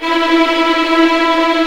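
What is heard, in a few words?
E4 tremolo f violin left, violins tremolo; East West; The Ultimate String Collection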